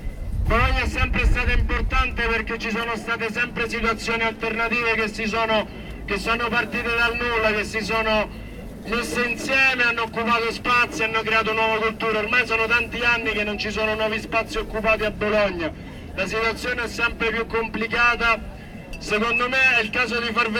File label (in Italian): megafono; bandaradio; ZooNews; interviste